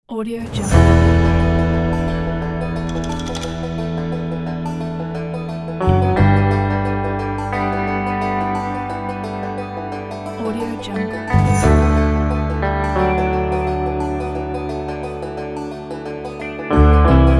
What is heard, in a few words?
spaghetti western